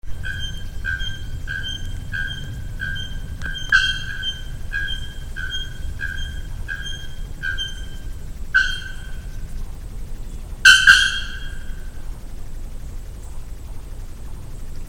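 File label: Procnias nudicollis; Jd Botânico; SP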